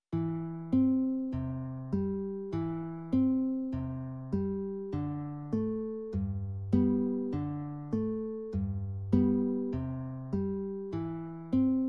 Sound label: accomp